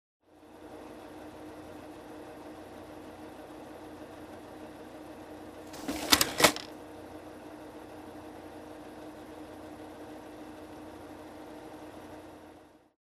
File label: Photocopier Machine Running